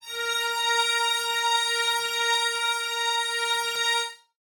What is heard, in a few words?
String Ensemble A#4